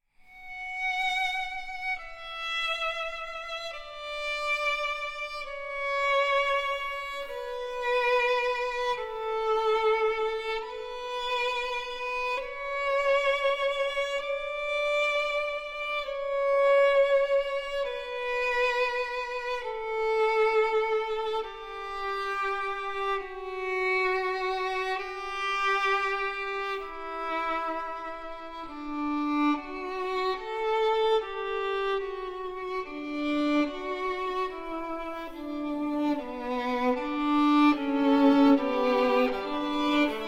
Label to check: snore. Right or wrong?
Wrong